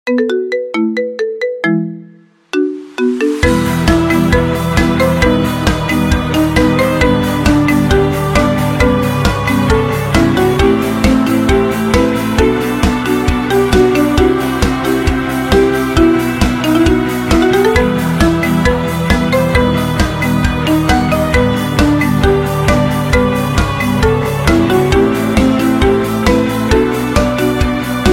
iphone ringtone marimba

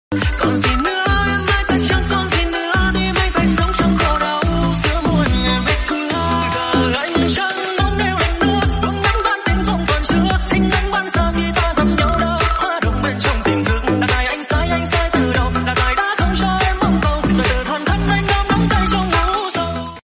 trot remix

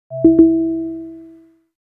oppo coloros 12 normal low battery sound; nhac chuong

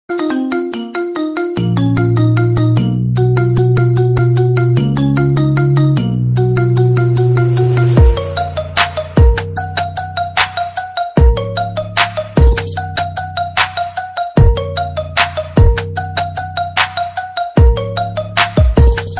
iphone

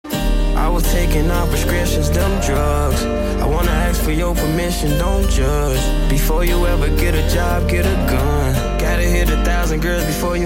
guitar, nhac chuong

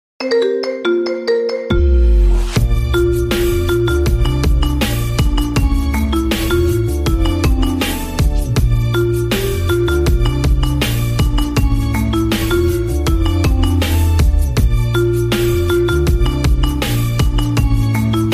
marimba

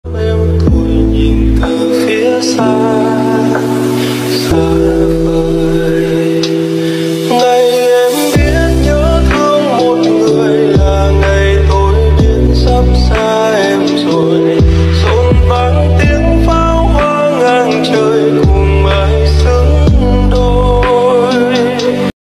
lofi

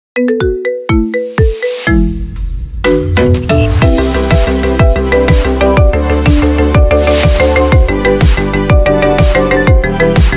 Marimba Remix

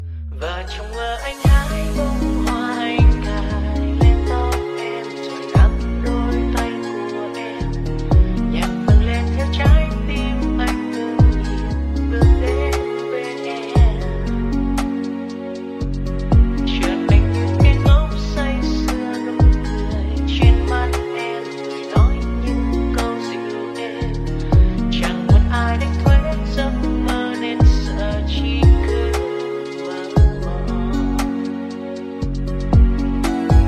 lofi

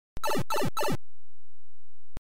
pipe sound effect; nhac chuong

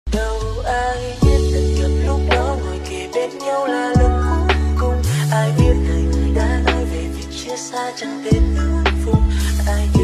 lofi

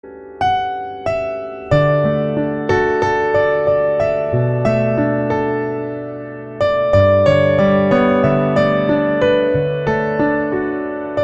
piano

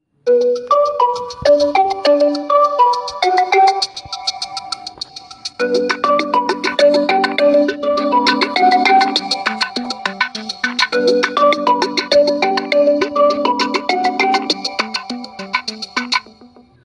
oppo reno11

